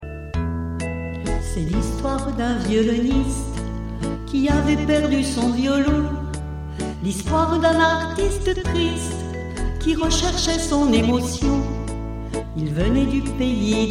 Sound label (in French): le violoniste